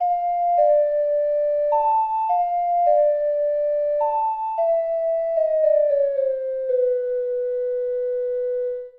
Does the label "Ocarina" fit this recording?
Yes